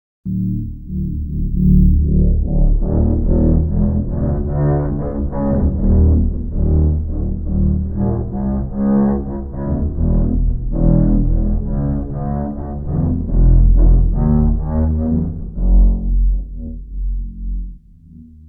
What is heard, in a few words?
Cello Transformation; Bizarre Planet